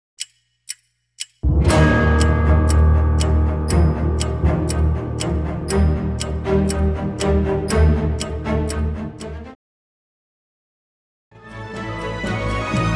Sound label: NEW YEAR COUNTDOWN AFTER PARTY 128 BPM